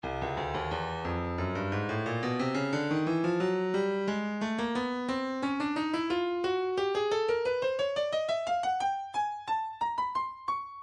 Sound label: breakbeat 089 bpm; Stylus Breakbeat Midi Files 085 089 Bpm; Bombadier D